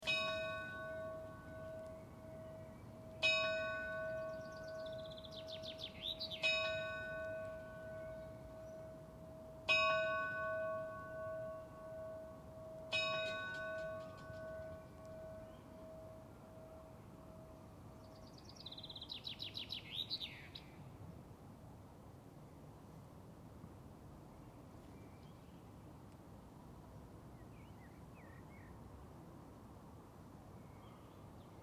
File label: Birds Bells Summer Evening, Bells ringing and birds singing in summer evening; Calm, quiet residential enviroment with a sense of light traffic in the d; Outdoor Sound Effects